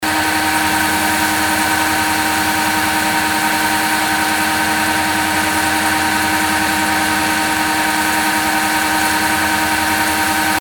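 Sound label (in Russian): звук производства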